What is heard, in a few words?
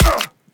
player damaged